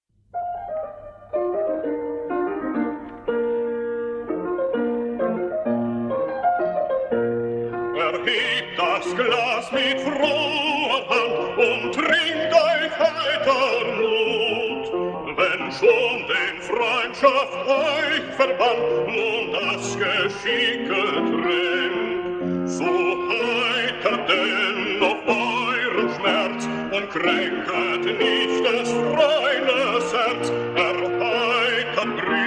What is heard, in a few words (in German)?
Trinklied Lied fur ein singstimme mit Begleitung von Klavier